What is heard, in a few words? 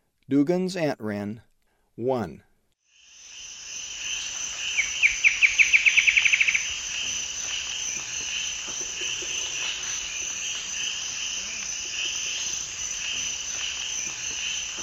Antwren, S. America Eastern Lowlands; Dugand's Antwren